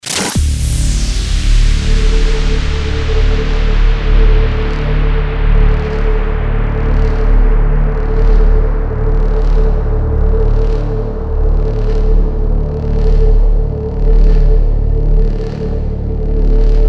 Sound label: DRONE STALKING 100BPM DMAJ